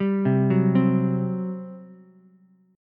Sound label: G Major 7th Suspended 4th, G, down, Ukulele